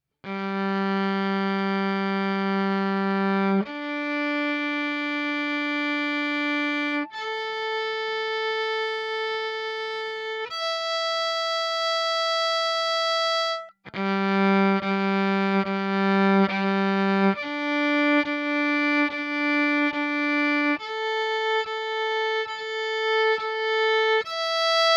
Vln Yamaha Pickup Mids Tweaked

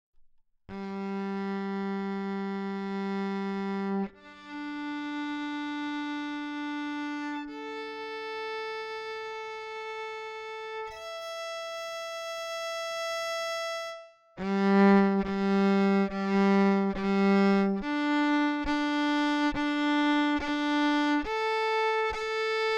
pickup Wilson Countryman DI

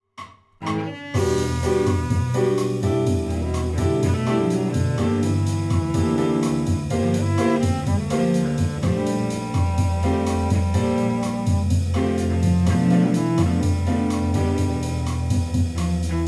C414 with Remic leakage at 100dB

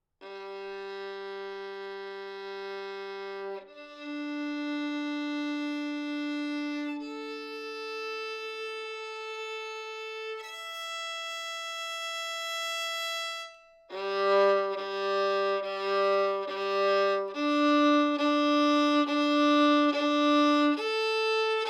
C414 Wilson Countryman DI

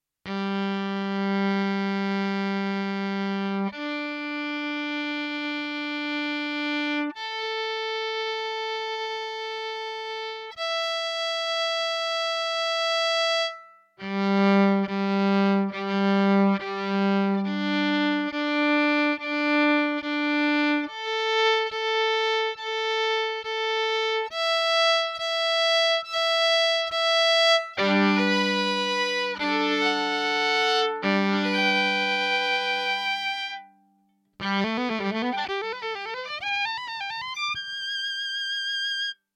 Violin Pickup Headway Hi impedance Highs

